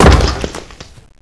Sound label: body splat